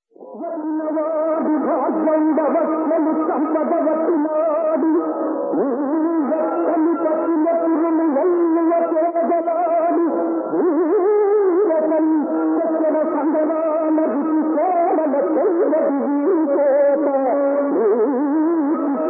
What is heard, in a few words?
Padyam; RenukadeviMahatmyam(1960)